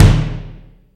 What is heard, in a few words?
fat kick; Greytsounds VOL-1; PROCESSDRUMS